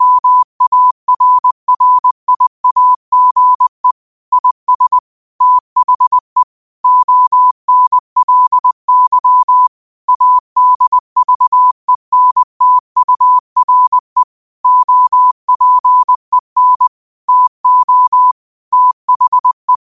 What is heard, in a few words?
5 wpm, morse